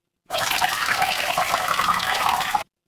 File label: water stream